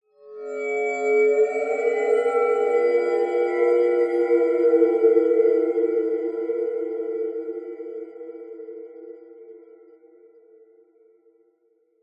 fantasy magic mysterious portal swell open with large reverb; zapsplat